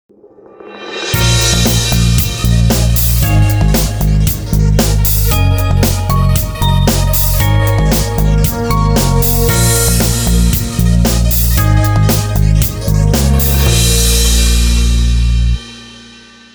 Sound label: uplifting intro